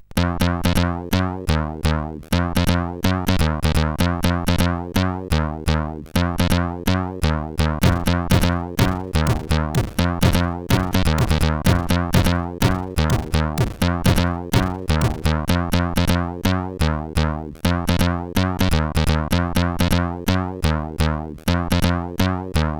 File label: Old Techno, CSG8580R5; sid